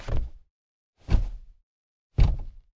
INT Body Thump in Car

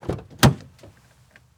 Car Highlander Door Open

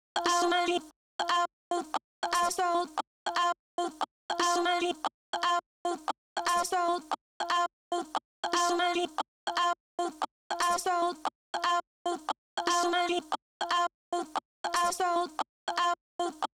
voc stutter

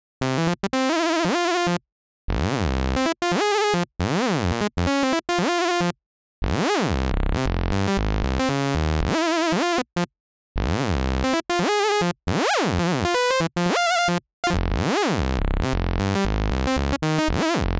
chiplead

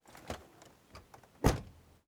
Vehicles Car Volvo 850 Door Open Close Soft

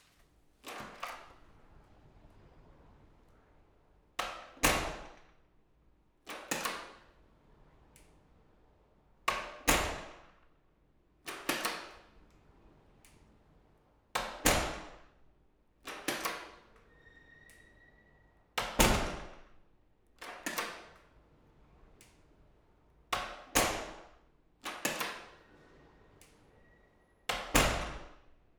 Bathroom Door

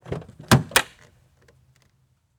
Car Highlander Door Open Hard

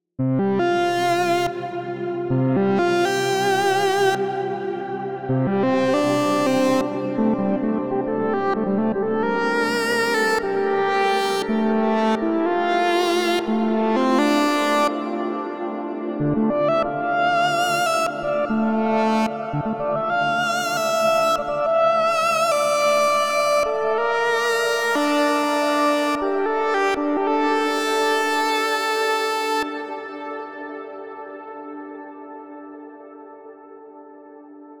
magic lead

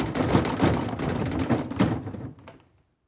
household kitchen drawer rattle

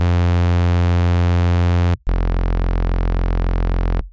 bass2 deep f

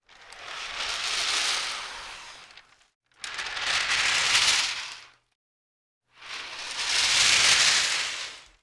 Kite Wax Paper Flutter Swells Lite

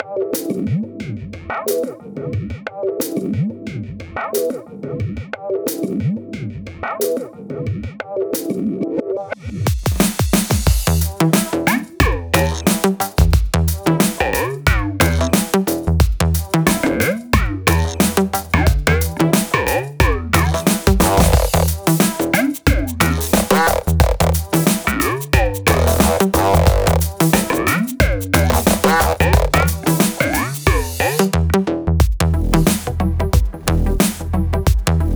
drum stuff

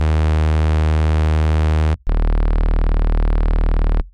bass2 deep Eb

